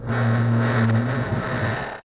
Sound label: whine